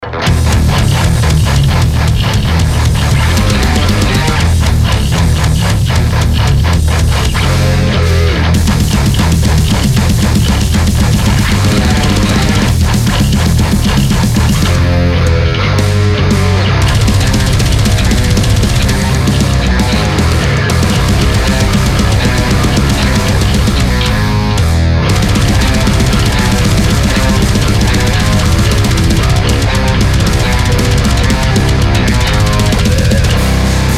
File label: deathcore; pre-production samples